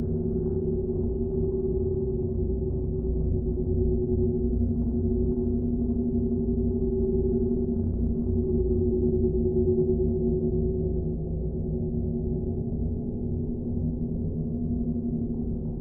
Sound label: tonal Radiations; the walking dead survival instinct gamerip